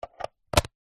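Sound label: electric garland sounds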